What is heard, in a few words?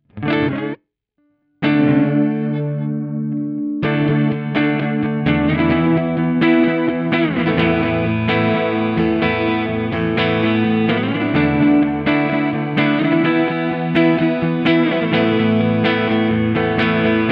Keeley Realizer Reverb Reverse